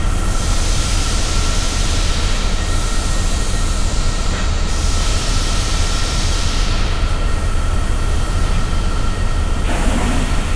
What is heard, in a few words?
cryo industrial; Quake 4 Alpha